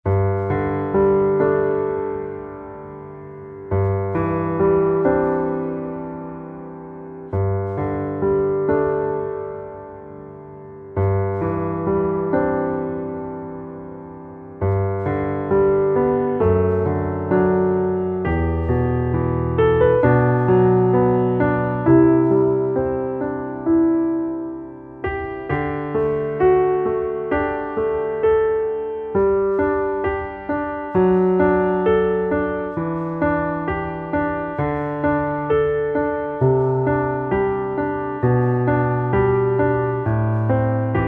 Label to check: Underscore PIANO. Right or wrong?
Right